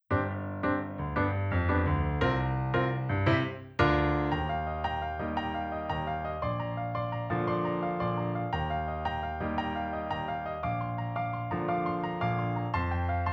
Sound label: piano